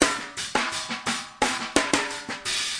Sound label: 86 tight offbeat snares, drum loops and breaks